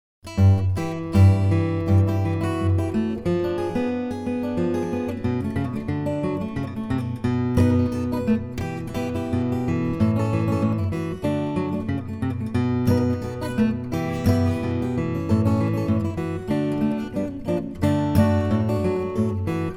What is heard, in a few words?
blues, fingerstyle; brendas blues